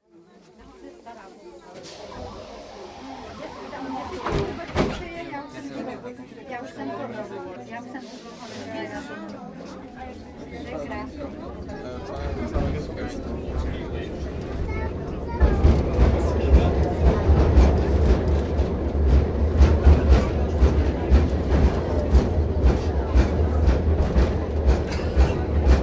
Funicular railway, internal ride, chat (Czech), doors shut, starts, constant run with rumble and more chat, doors open, people get out; Train; Funicular Railways; BBC